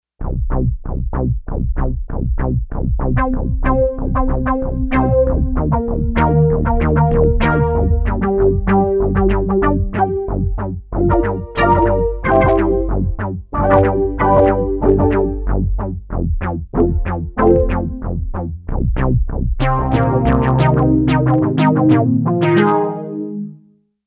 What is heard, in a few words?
OBlip; Digital Synthesizer